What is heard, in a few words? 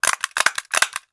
Stapler; Office Supplies; 1001 Sound Effects